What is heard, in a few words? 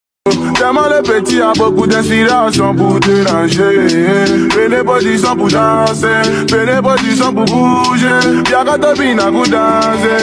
English Song Ringtone